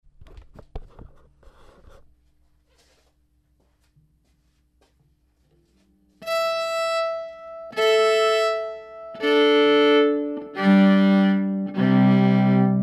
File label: 7 string with AER amp